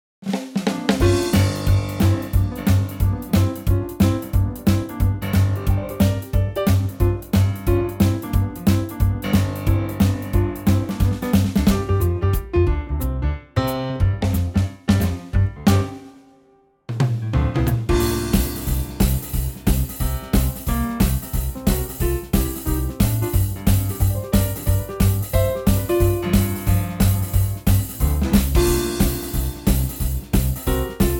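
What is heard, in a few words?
C swing, male; TRIO; key - C - vocal range - G to B (intro in G)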